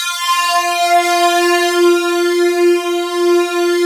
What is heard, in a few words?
SYN, Analog; Sound & Vision; Gigapack I CD 2